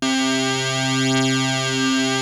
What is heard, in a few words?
PPG C3, Rare Synthesizer; Hollywood Edge; Giorgio Moroder Rare Synthesizer Collection; PPG.2.3